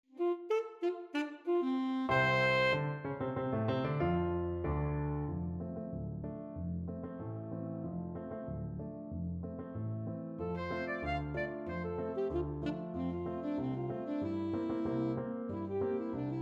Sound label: saxophone